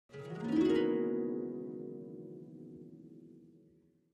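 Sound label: Harp Thin Short High Strings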